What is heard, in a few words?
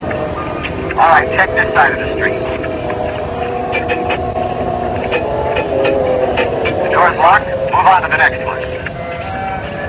locked